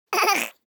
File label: BABIES LAUGH Boy 12 months Short; Baby Boom